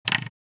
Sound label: Radio volume control knob handling